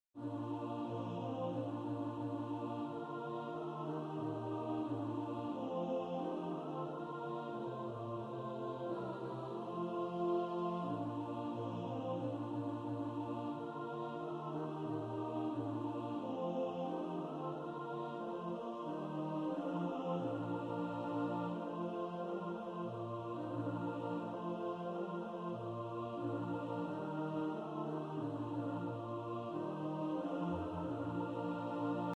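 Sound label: alto, practice music; Rescue the perishing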